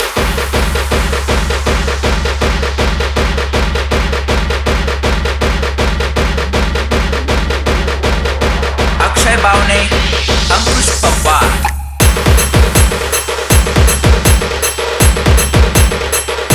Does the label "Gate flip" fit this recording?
No